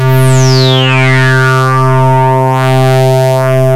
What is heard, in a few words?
TB TREB, TWAV BASS; Soundengine - CSR-000 The Art of Transwaves